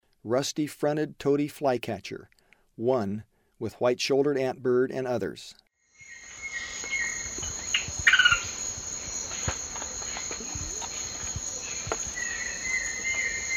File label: Rusty-fronted Tody-Flycatcher; S. America; Eastern Lowlands